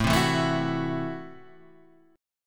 F sharp Minor 7th Flat 5th A

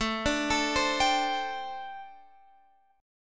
D 7th Suspended 4th, chord

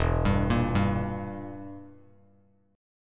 E Diminished E, Bass Standard

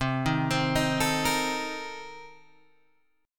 C Minor 13th C Guitar Open G

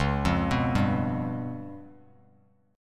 Db 7th Flat 5th, Bass Standard; Db7b5 arpeggiated; JGuitar

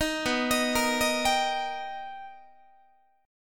B Augmented Major 7th B down Guitar; B+M7; arpeggiated; 18,19,18,20,19,18; JGuitar

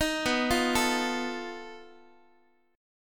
B 7th Flat 5th B Guitar; B7b5; arpeggiated

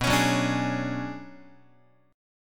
B Minor 6th Add 9th B, Guitar; Bm6add9 strummed; JGuitar

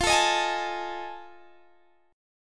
F 7th Sharp 9th, Guitar, Open G

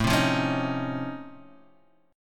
Db Augmented Major 9th, A

